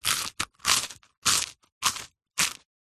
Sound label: human eat bite into eat crispy traditional french baguette